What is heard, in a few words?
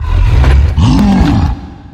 groan, zombies